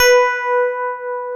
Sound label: PIA DX E-PIANOS